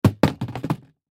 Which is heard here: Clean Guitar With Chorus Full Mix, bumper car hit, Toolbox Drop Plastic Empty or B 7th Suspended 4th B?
Toolbox Drop Plastic Empty